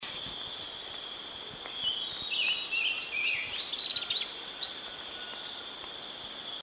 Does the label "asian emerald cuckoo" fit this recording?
Yes